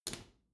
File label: bathroom cabinet close soft; objekte; tueren